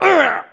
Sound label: Hit; Blind Quest - The Frost Demon